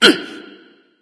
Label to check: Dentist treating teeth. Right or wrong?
Wrong